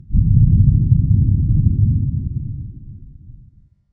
grom dalkiy; zvuki buri

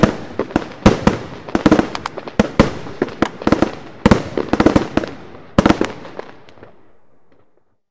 salyut seriya zalpov; zvuk shutih

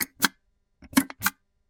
penyaschuyusya massu vyidavlivaem bulkayuschiy zvuk, krema

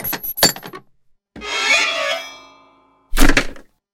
sunduk s sokrovischami otkryivaetsya

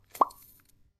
krem zachrpyivaem paltsem chpok

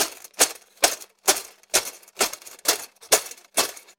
ryitsar idt lyazg dospehov; zvuki dospehov

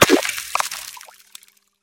provalivaemsya ld lomaetsya i plesk

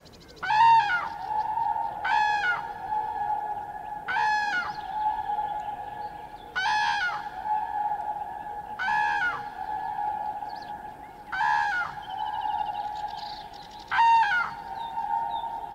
raskatistyiy zov na zakate, zvuki kriki juravley

